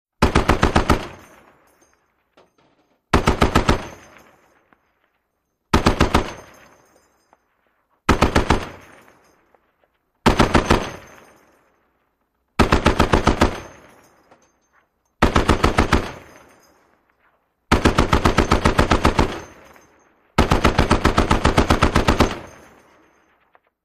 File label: .50 Caliber: Long Bursts With Shells Dropping